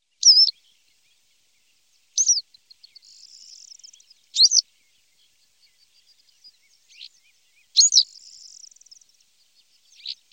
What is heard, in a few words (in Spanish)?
lavandera boyera